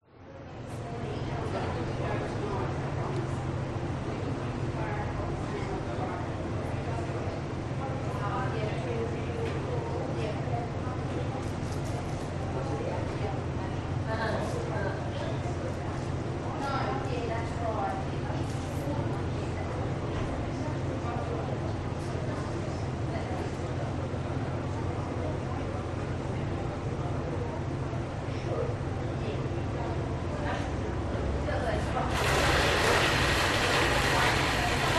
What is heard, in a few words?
public places cafe coffee shop people machines whir